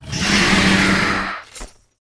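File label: Rat buff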